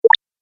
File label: Sound Security Lock, dong sound effects; multimedia